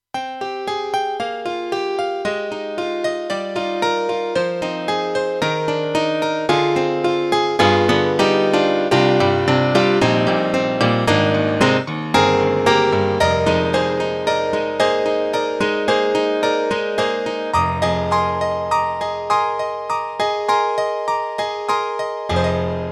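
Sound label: Electric Grand Piano; YAMAHA TG300 GM-B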